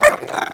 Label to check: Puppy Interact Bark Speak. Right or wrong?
Right